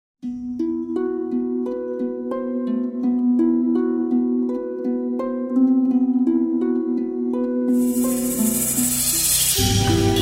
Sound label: Slow Song, Instrumental; 3 Nights 4 Days (2009)